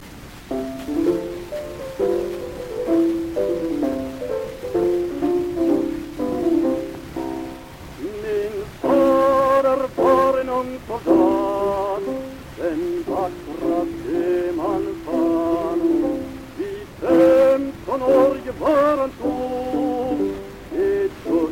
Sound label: Gramophone